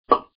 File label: pop